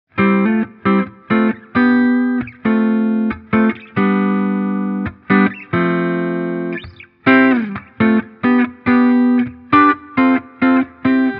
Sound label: Impro C F G